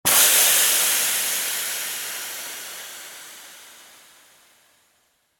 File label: Impact Auto Tire, Impact, Automobile, Tire, Puncture, Release, Air; Crashes